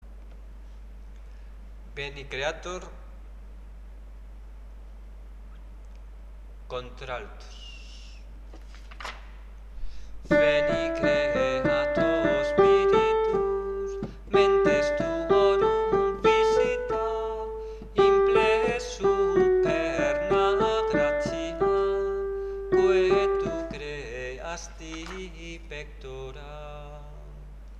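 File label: veni creator 4 voices alto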